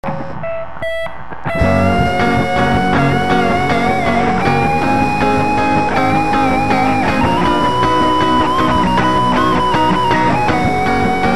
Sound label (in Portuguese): solo